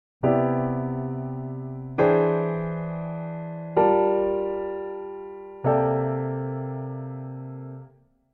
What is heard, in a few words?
voicing 7th LH